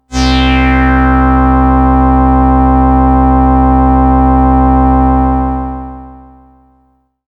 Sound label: Sun-Sigh F, synthpad